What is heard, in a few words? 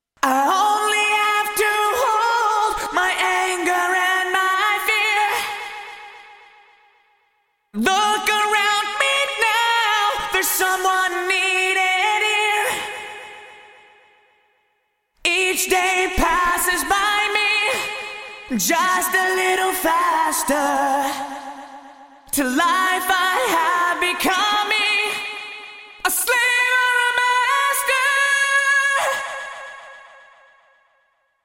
Vocal Korg DRV 2000 Modulated Delay; Vintage Digital